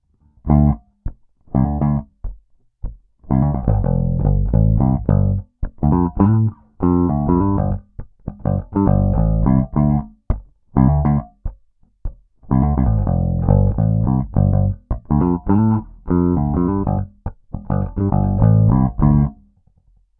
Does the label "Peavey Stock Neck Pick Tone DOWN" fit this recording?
Yes